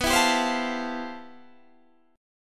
C Major 13th B

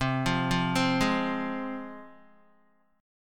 C Suspended 4th Sharp 5th, C, down, Guitar; Csus4#5 arpeggiated; JGuitar

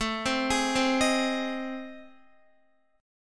A Minor Major 7th Flat 5th, Guitar; AmM7b5; arpeggiated; chordshape; x,12,10,13,12,10

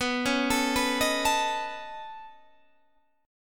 Db 7th Suspended 2nd Sharp 5th

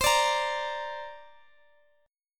C 7th Suspended 2nd, Guitar, Open D

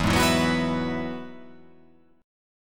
E Major Add 9th

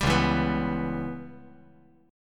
Db Minor 7th Flat 5th Db

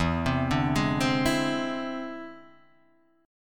E Augmented 7th E down Guitar Half Step Down

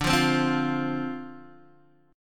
D-Minor 7th D Guitar DADGAD, chord

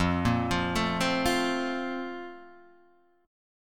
A sharp Major 7th Suspended 2nd, chord

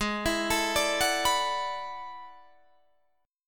E 11th Ab

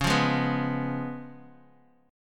Db 7th Db down Guitar DADGAD

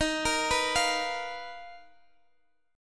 B-Major Sharp 11th Eb

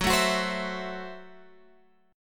Gb Minor 7th Flat 5th, Guitar Open G

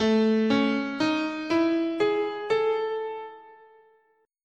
A Chinese

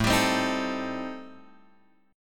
A-Minor 7th Flat 5th, Guitar Open F, chord; Am7b5; strummed; x,4,7,7,6,4

